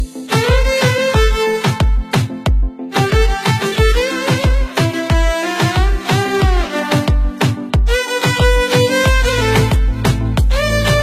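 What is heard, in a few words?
Guitar Ringtone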